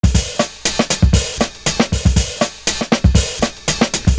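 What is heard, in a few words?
TOAST BEAT, 119 bpm; Univers Sons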